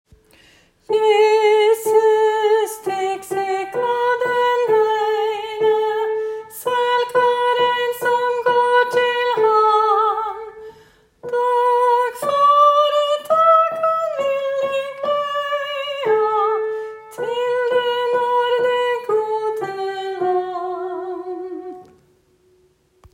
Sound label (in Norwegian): tenor; jesus tek seg av den eine; åfjord